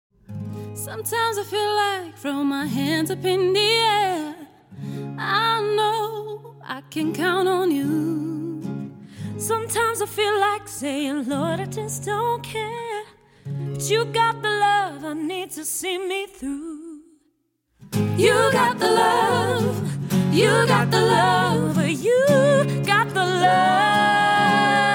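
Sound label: Indie/Rock Choir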